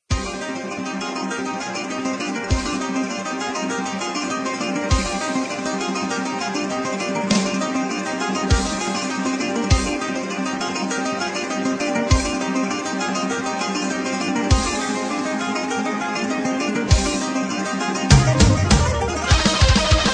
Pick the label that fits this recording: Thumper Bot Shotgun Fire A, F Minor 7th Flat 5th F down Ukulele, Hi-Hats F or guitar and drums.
guitar and drums